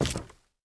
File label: Nomal Wom Walk